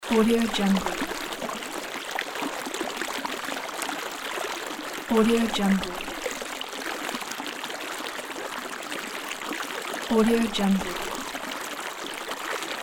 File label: little river flow